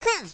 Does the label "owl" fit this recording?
Yes